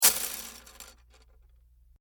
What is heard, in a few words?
Wood Debris Foley Small Pieces Falling On Cement; Materials